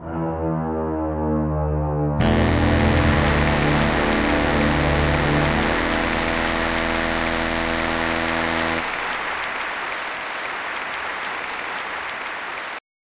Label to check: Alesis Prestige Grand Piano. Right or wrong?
Wrong